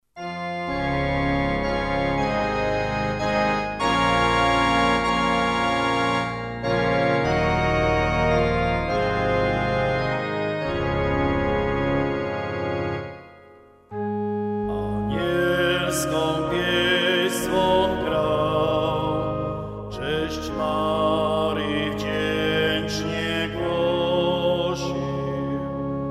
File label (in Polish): anielska piesn dzwon